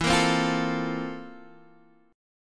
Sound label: C 13th E